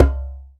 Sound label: Djembe Slap Gun; Planet Earth; Digital Sound Factory Planet Earth